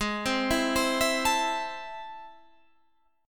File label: A Minor Ab, guitar; Am/Ab arpeggiated; JGuitar